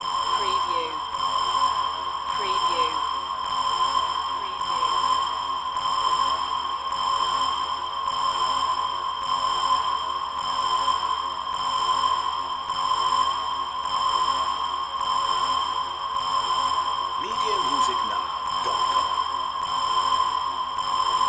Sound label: Space Station Alarm